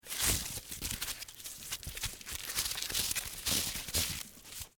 Rope Untie Knot, Heavy, Rope; Hand Tool Sound Effects; BlastWave

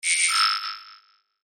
Chime Multimedia; Web Interactive; Chimes (Sound Effects:Multimedia)

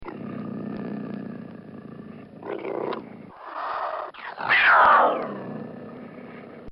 Cougar Roar

